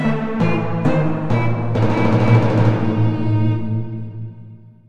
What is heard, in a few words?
lose music; pvz1